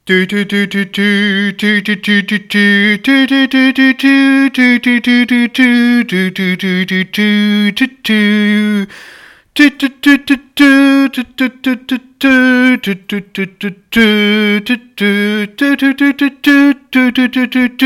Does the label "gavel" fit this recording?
No